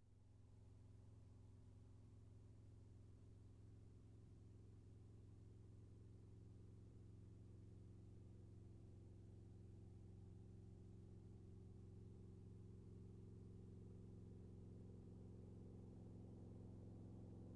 Motorcycle passes by

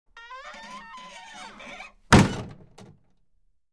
wooden door closes with creak

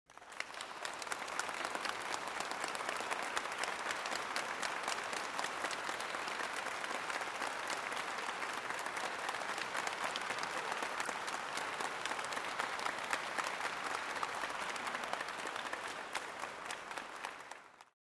Applause in the concert hall; standing ovation

